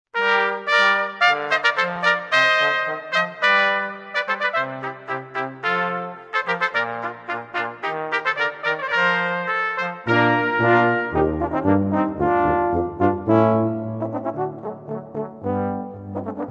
imperial brass

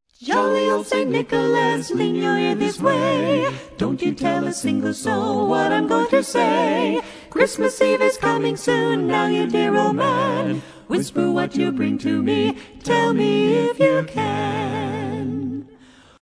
Jolly Old St Nick

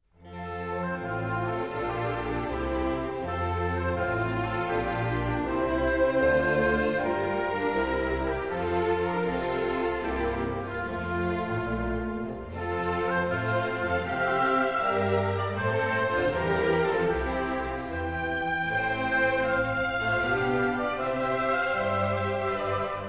water air, classic strings

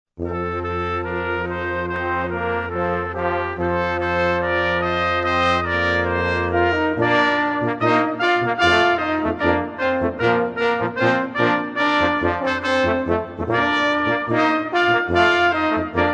brass ode to joy

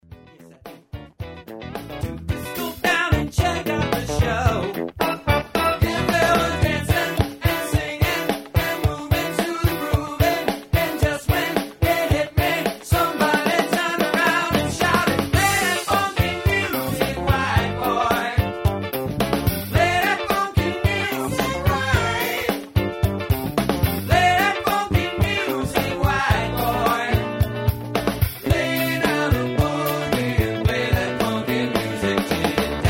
funky music

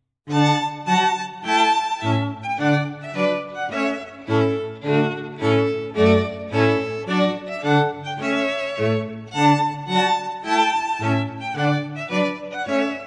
Trumpet Tune, strings; H. Purcell

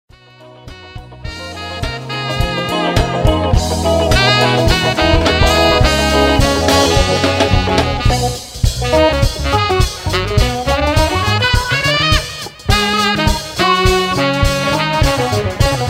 Jazz

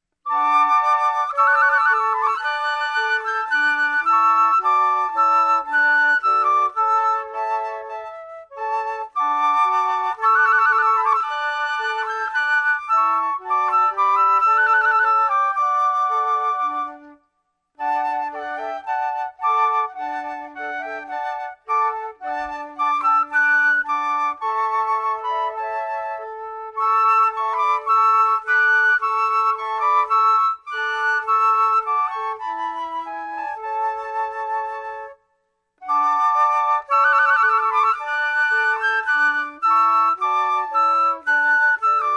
trumpet voluntary, flute

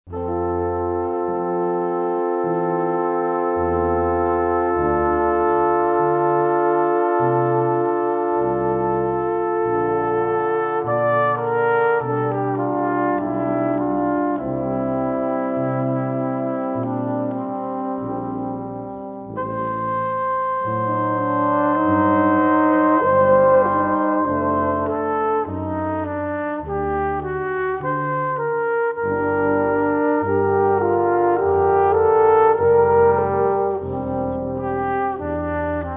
brass; air on g string

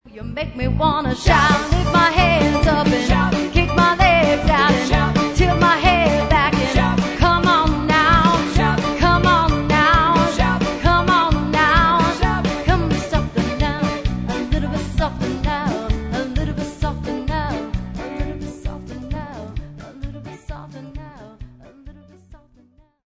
shout

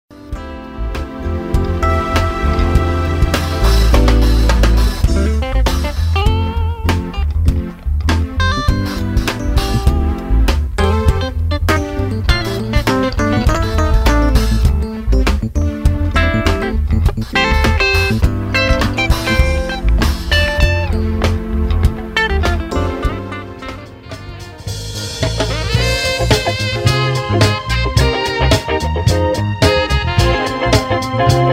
Jazz

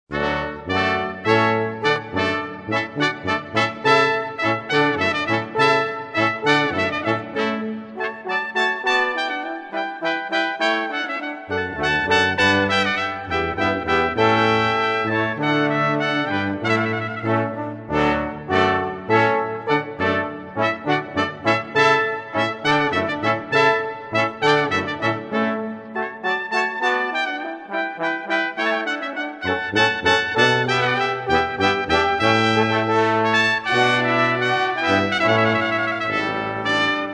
brass, allegro maestoso

